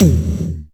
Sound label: percussion, Dance Synths; Mad Kit